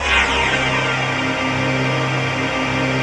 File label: ATMOPAD; Best Service; XX Large; Pads